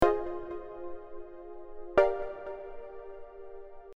Chords Midi Deep House

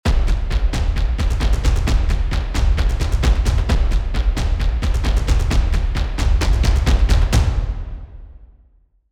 Epic loop

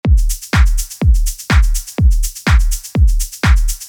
Drums Loops

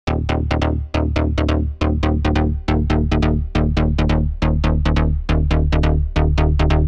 Bass Trance Serum

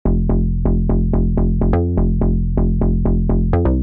Bass Melodic Techno Vital

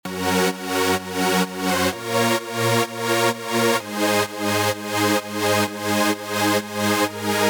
Edm Chords

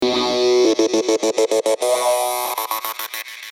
Serum Acid Psytrance

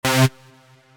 Synth One Shot

